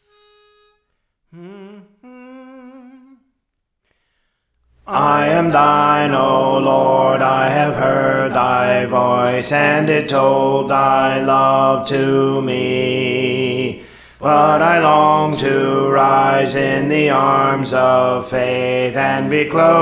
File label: Alto; Praise For The Lord Training